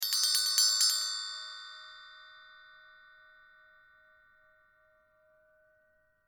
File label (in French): son Cloche Tibet; Percu; Cloche 6; Duperrex